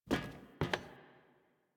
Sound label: Steps; Warframe; Lotus Intro